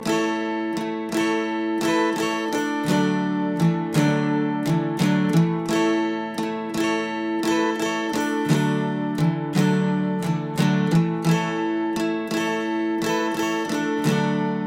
zatsiklennye melodii dlia gitary loop

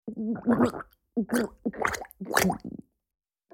zvuki smerti i boli

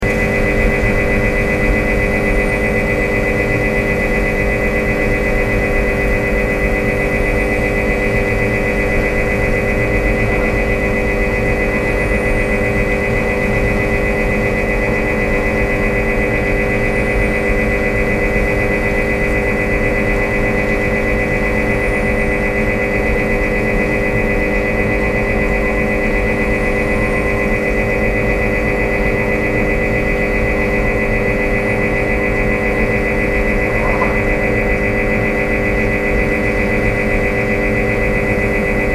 zvuki vetrogeneratora

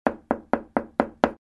zvuki dverei

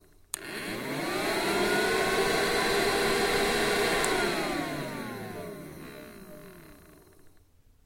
zvuki ruchnogo pylesosa

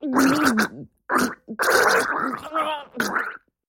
zvuki pererezaniia gorla

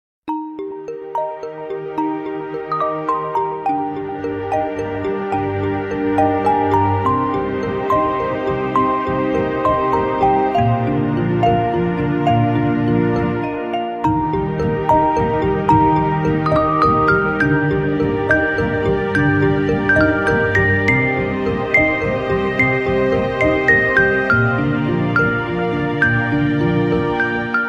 Nokia 808 originalnye ringtony i uvedomleniia

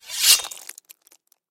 zvuki pererezaniia gorla

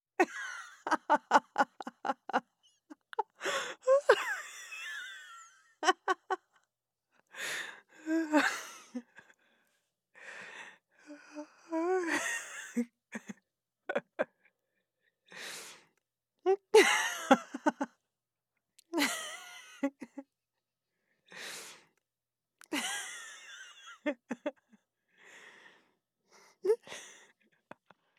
zvuki zhenskogo smekha

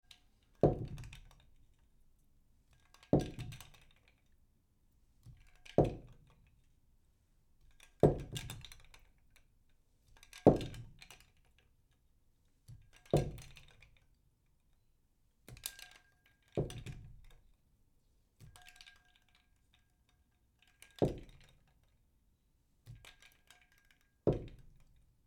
zvuki barnogo sheikera

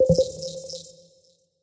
elektronnye zvuki peredachi dannykh

